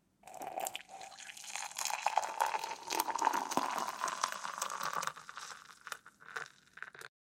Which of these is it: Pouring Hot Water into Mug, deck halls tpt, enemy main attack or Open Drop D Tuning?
Pouring Hot Water into Mug